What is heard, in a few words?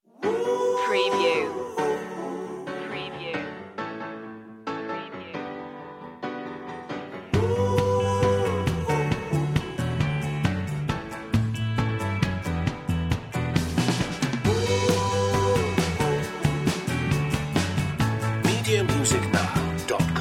pop